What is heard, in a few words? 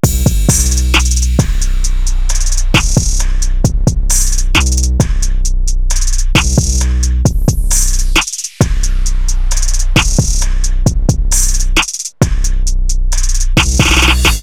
percussion loop 133; SONNY D